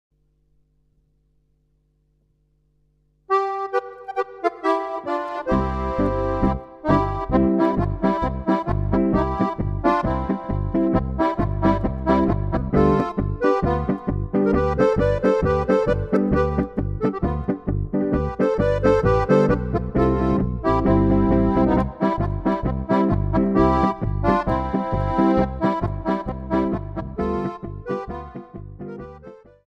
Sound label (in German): polka